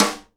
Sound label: SIDE STICK, Live Snares